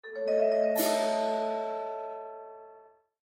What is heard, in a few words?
Stingers; The Dwarf Run; EV Search For Oil